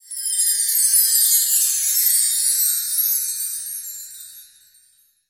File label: correct answer